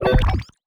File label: Menu Inspector Window Open; Custom UI Sounds; Slime Rancher